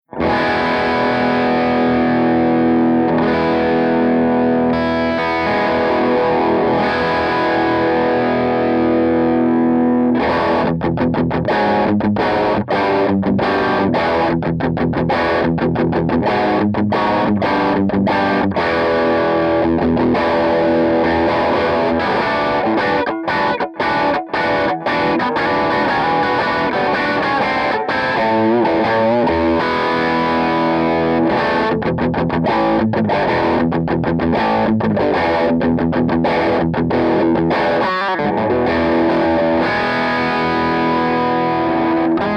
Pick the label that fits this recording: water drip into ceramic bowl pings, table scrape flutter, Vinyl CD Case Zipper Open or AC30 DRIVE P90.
AC30 DRIVE P90